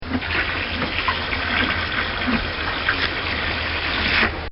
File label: Fill Bucket With Water; Nature